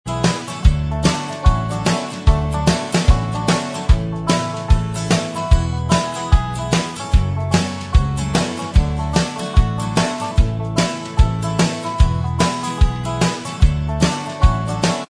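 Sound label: instrumental track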